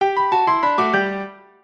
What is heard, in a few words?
level success